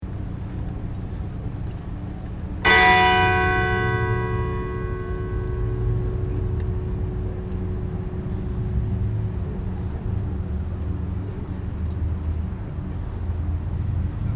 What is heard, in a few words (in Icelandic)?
akureyrarkirkja likhringing, kirkjuklukkur; Akureyrarkirkja var vígð sunnudaginn 17. nóvember 1940